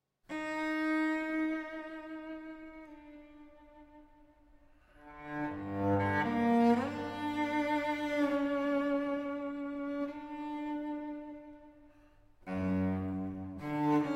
for Cello, Strings and Percussions

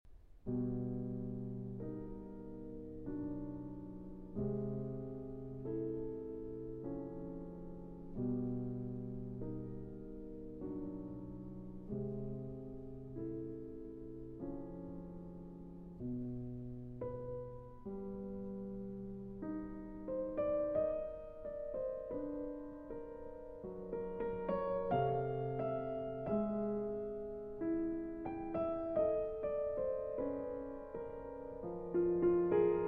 Piano Sonata in E-Flat Minor

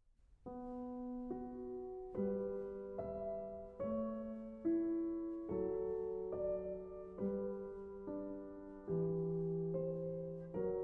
Organ Sonata No. 4, Andante [Adagio]; Transcr. By August Stradal

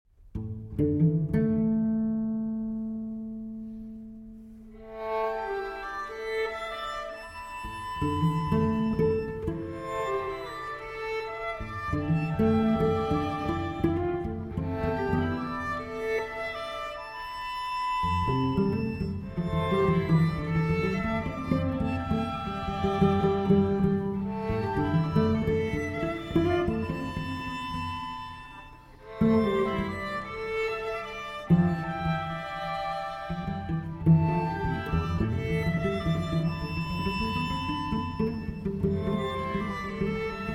String Quartet; No. 1 Pictures From Warsaw II. Tears

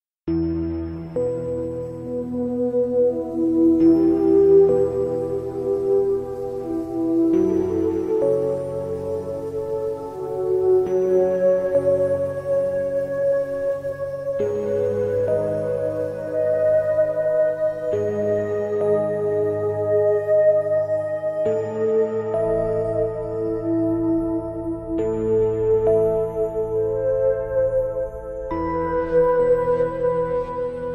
Ambient Space Music

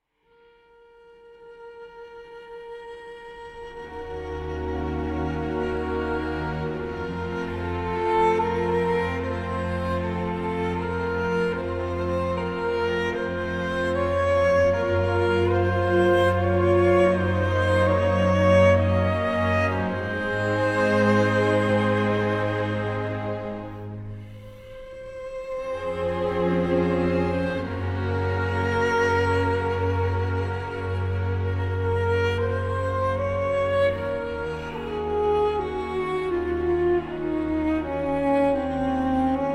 Adagio For Strings

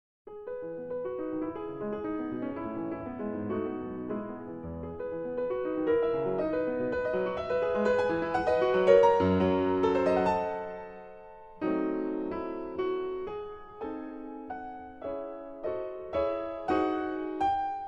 Piano Sonata No. 30 in E Major

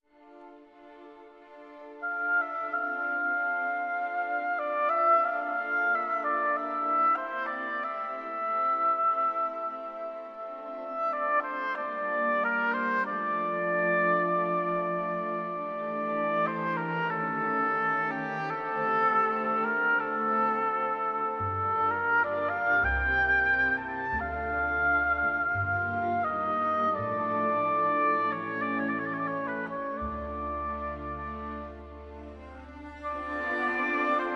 Vocalise (Arr. For Oboe)

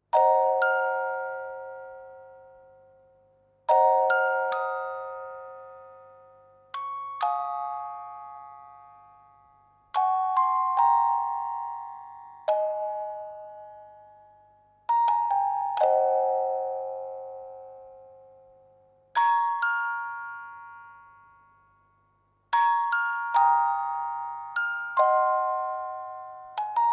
Orgel Version

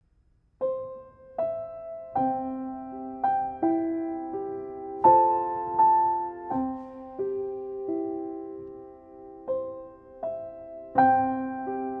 Kum Ba Yah (Instrumental Version)

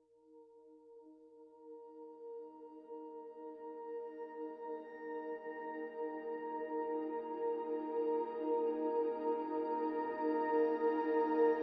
Ocean Wind

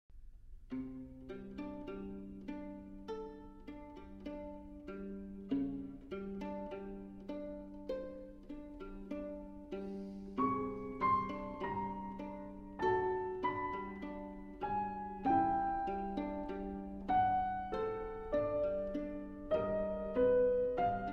Sonata For Viola and Piano Moderato

